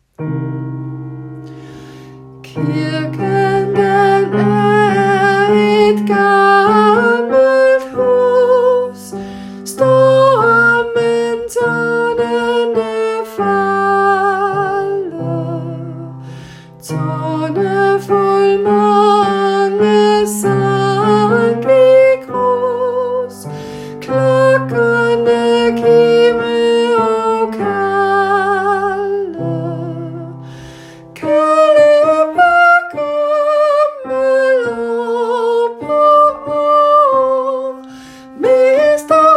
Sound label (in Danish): tenor